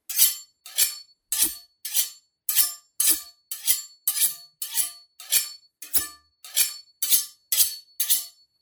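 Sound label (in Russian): ножи точат один о другой; Звук ножа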